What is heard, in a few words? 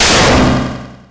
thunder; undertale